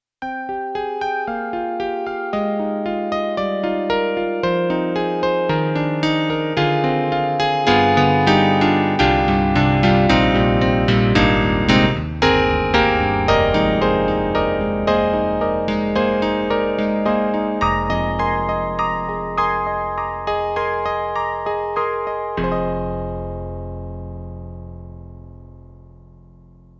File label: Roland SC-88ST Pro Piano 2